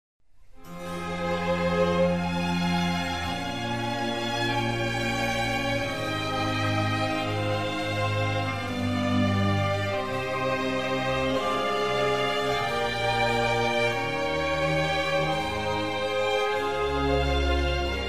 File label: Concerto for Strings in F minor; RV143; Antonio Vivaldi